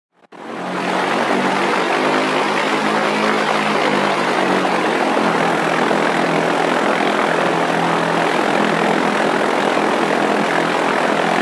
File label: microlight flying sound effect; myro the musical